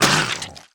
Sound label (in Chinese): ruchong; monster